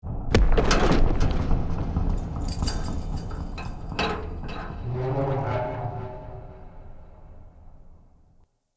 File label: Castle Misc Metal; Ambience; Midrath Island